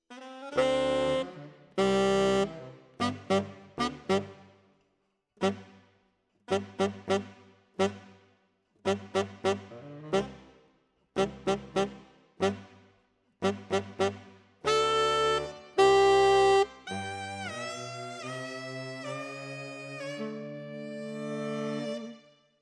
Tenor, RNR for piano

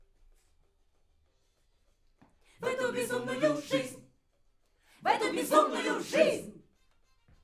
Backs; SCHOEPS

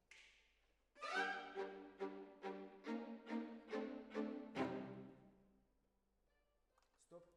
Decca, Jazz for piano

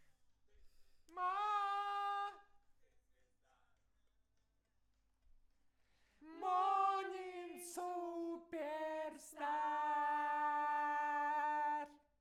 Voc

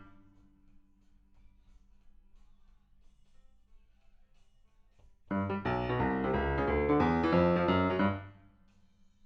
PIANO, RNR for piano

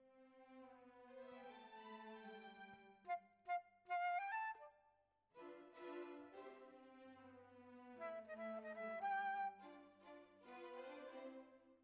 Flute

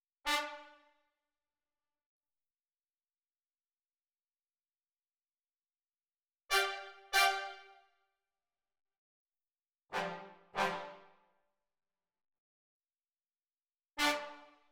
brass

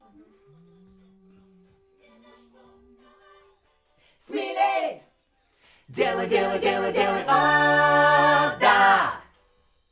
Backs AKG

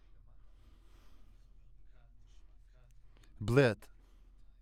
Voc OD

